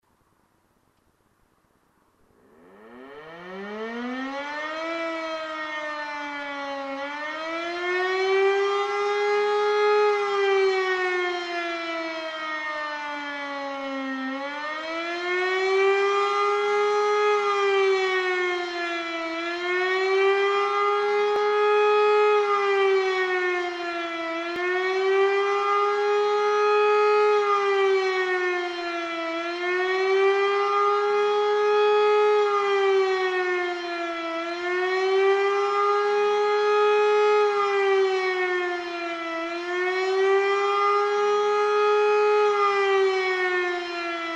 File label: alarm